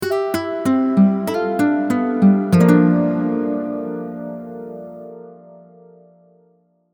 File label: Chiptune